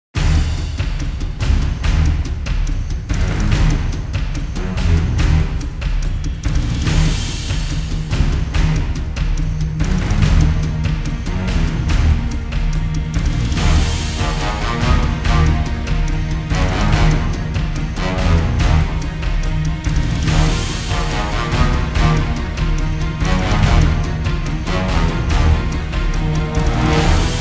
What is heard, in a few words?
Western Greek Battle Theme